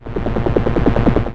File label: move air chopper